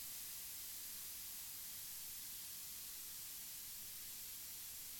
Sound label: steam loop